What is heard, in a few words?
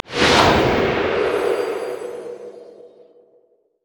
global death explo; LoL